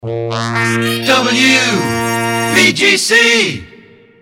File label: jingles resings ascending notes; pams series 42a